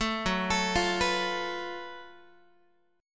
Gb Minor Major 7th Double Flat 5th Gb Guitar; arpeggiated

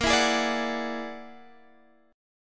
E 9th Flat 5th A sharp, guitar

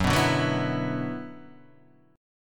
Gb 7th Suspended 4th Sharp 5th Gb; strummed; JGuitar

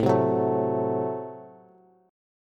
A Minor 6th A Bass; Am6 strummed; JGuitar

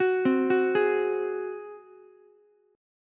F sharp Suspended 2nd Flat 5th, Ukulele; arpeggiated; down

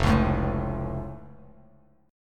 F Augmented, Bass Standard; strummed; down

